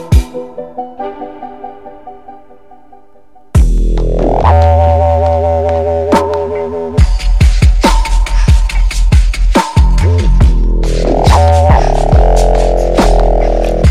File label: taiko dub